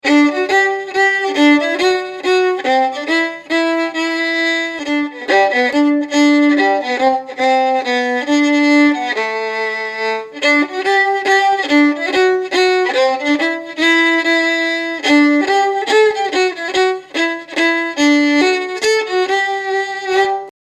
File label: Polka Mazurka; Harry McQueens